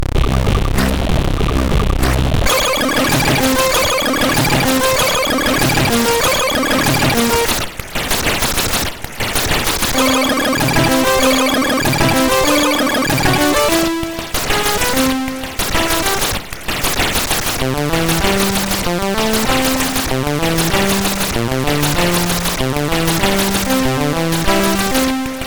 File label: Train in Love, MOS6581R4